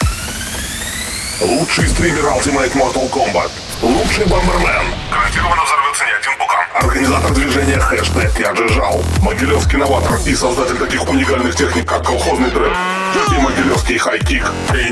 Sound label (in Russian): Джингл